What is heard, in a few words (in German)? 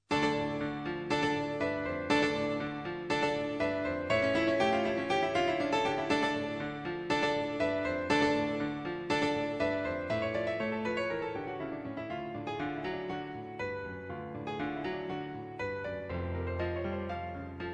klaviersonate